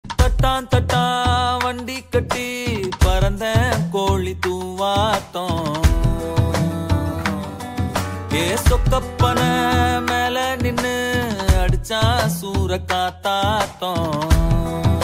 Tamil Song Ringtone

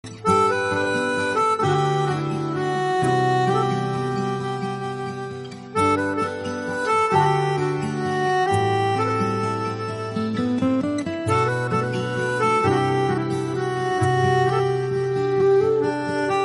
Romantic Bgm

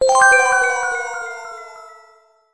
huawei Mate Pad Pro 12.6 Sms

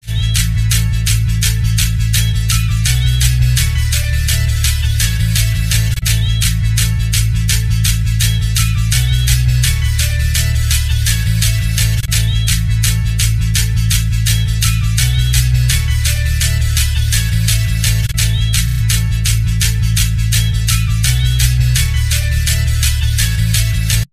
Ringtone; Nokia 5.4

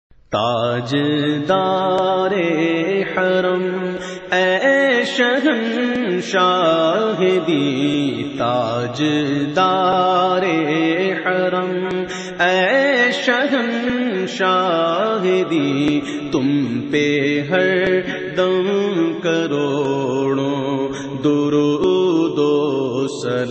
Islamic Ringtone

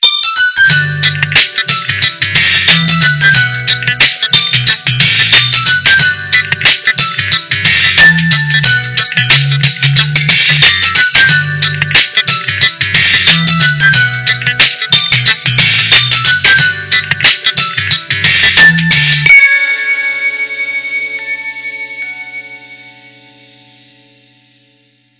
Ringtone; Nokia 6300 4G

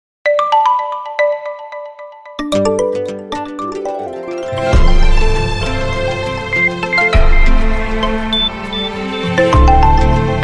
Vivo S15 Pro Official, ringtone

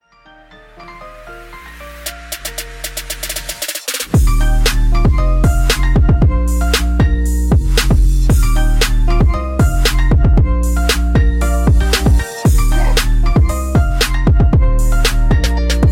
Bass Boosted Mix Nokia

